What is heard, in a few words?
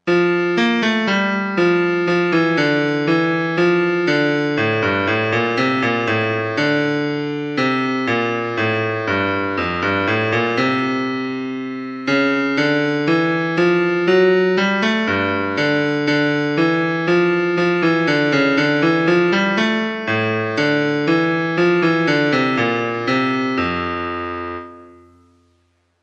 Bass